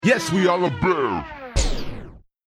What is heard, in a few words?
Turntable Scratching Stop Brake; Dj Sound Effects; DJ (Sound Effects:Musical)